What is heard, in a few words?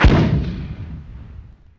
60mm mortar; WAR